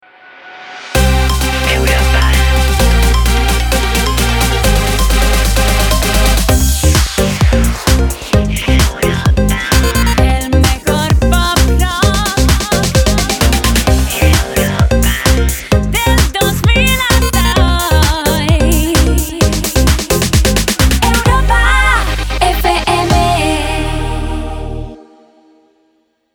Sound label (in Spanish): JINGLE, identidad sonora corporativa; JINGLE GENÉRICO HORARIO; Europa FM 2018-2019; Creación musical y composición logo sonoro